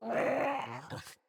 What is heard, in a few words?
Kubrow Puppy Idle Yawn; Vocals